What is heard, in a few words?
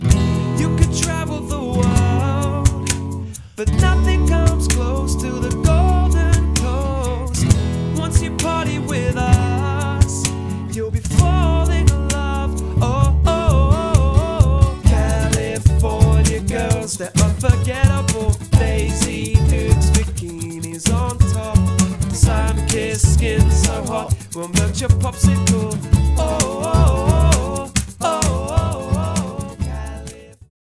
Roaming Acoustic Band London Rambling Band, acoustic band